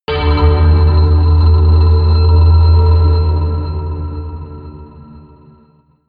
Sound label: melodic anvil scrape low, drones